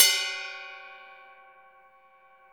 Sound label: CYM Ride; Best Service Gigapack 2